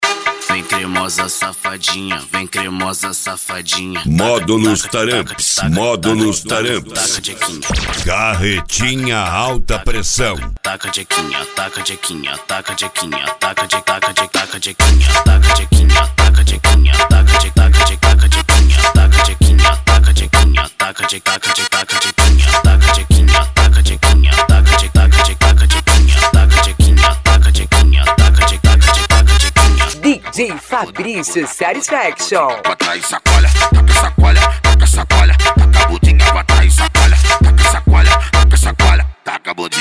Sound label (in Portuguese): ritimada agressiva